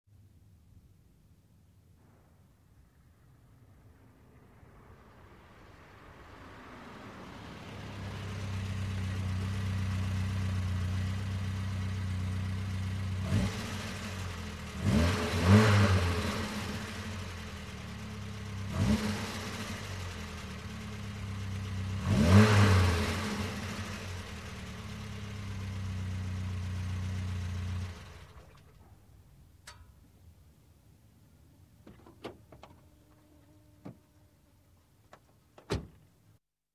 Golf GTI Approach And Stop; Sound Ideas; Series 2000

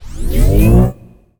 ship weapon Ready; Space Wave Race

